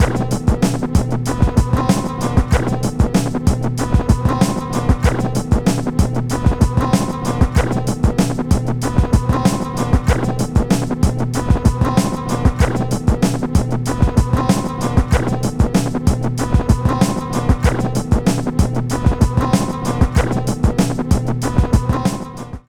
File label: Menu Loop; NBA Street; GC; PS2